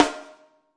real snare, REAL LIVE KITS; A Real Freakin Drum Kit

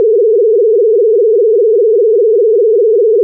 laser treatment, Electro and Synthetic

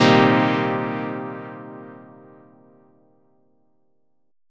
stab satisfying

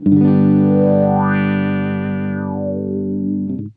wah chord g, Guitars

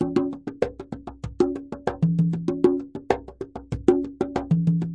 97 plain conga continuous; 091 to 100 bpm

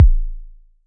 click simple, Kicks; single hits

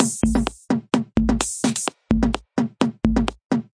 128 bpm practically a metronome groove

